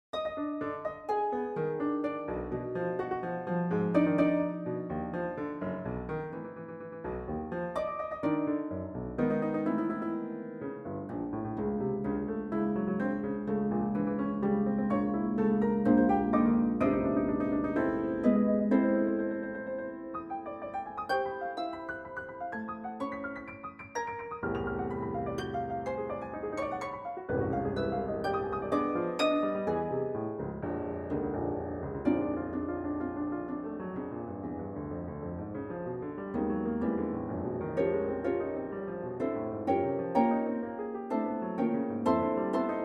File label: Bagatelles for Harp and Piano; Five Bagatelles for Harp and Piano